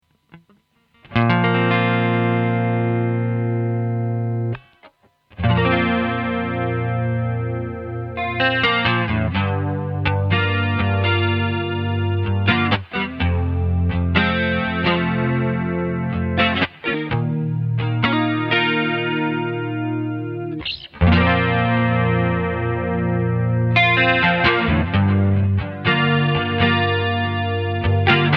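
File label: Chorus Delay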